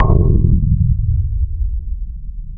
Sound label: KRAFT PERCSN, ROLAND MKS-80; Rubber Chicken Software Sample Bank